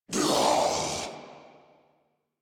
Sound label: Death Corrupted Grineer Male